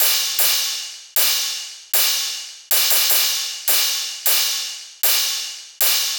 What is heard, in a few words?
Hybrid Trap Kit 155bpm Crash, Drums; EDM Drums; Drum Kits; Stems; Ghosthack; Ultimate EDM Drums; Drum Mega Bundle; Hybrid Trap Kit 18